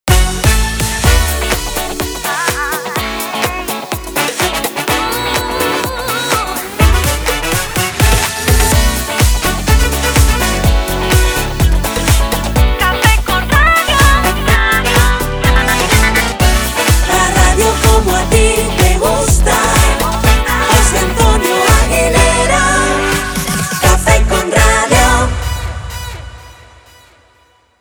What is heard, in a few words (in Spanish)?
Vocal